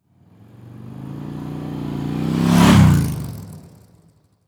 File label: Auto Motorcycle Drive By Fast; Transportation